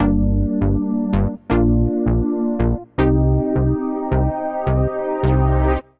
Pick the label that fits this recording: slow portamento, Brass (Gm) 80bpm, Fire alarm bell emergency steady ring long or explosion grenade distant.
Brass (Gm) 80bpm